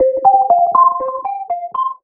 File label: Sonalert; Sonalert Mess; Sony Ericsson; PlusFourSix; Alicia (W707)